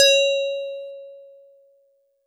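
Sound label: Metal Tube DX100 C#1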